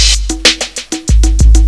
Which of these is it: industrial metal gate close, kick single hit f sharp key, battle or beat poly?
beat poly